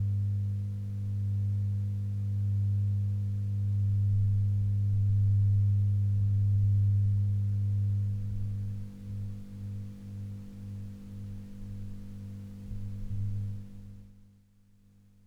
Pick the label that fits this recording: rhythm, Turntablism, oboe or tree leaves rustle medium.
Turntablism